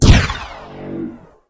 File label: heavyship Fire, enemy Weapons; gamegape